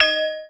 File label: spelltick, halloween; Garry's Mod; tf2